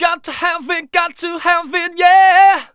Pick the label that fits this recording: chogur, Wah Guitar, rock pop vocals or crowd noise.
rock pop vocals